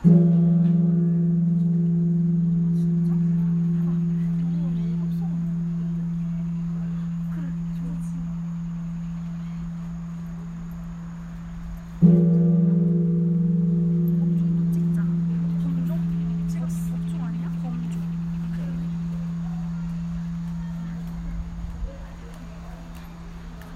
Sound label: Dharma, Dharma Bell, 3 hits, Korea; Dharma Bell, 3 hits, Korea 37"; Infinity Room